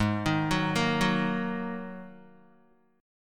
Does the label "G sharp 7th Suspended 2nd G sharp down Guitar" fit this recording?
Yes